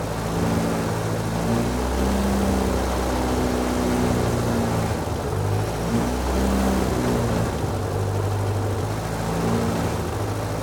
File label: Car Loop; android; Does Not Commute